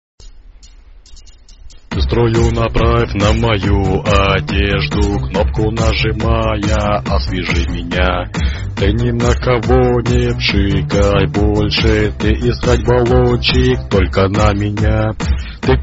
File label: russian anthem